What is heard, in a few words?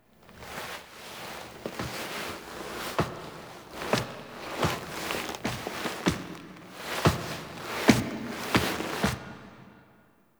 Orbiter To Lair Foley Operator; Warframe; The War Within